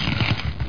boss spit; dosgames; roc

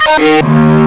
Cello; bars&pipes sound kit 1; Instruments

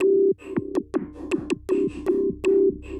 AMBNT 160; Ambient

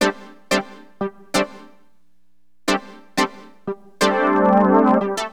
POLY, Keyboards; HipHop; LiveAct Pool 1

